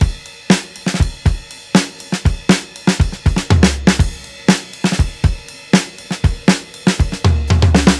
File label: RIDE, drums; BASEMENT DRUMS VOL 2